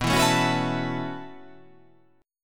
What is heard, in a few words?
B 9th Suspended 4th B down